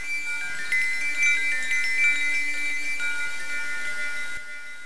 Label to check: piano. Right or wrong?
Wrong